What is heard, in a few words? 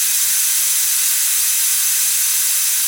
Atmos; Synthetic's Serum Collection